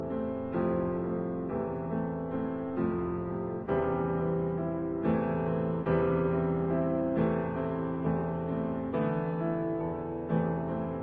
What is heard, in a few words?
arr for piano; Ostrov myortvikh The Isle of the Dead Op 29